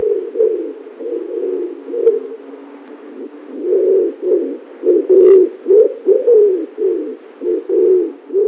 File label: w pigeon